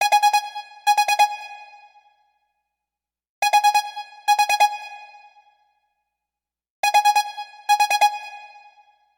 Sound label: Alarm Clock